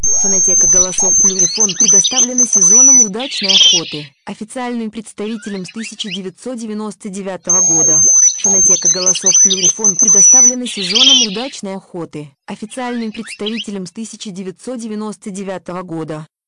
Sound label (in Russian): рябчик; plurifon